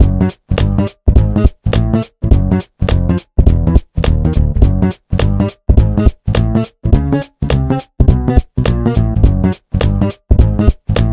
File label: Elastic Bookmark Bass, ringtones; Samsung Galaxy Note 9